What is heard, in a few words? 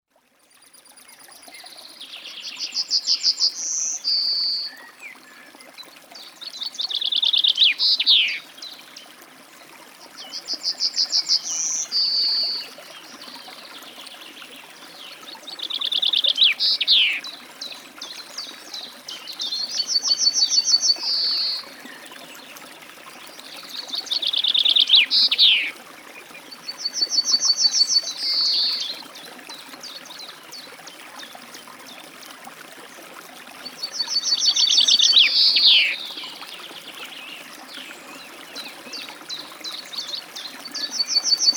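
chaffinch and bunting by a forest stream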